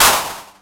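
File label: 909 clap; PERC TR; Wizoo - Platinum 24 Electronic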